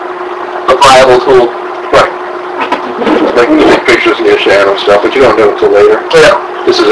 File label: male giggle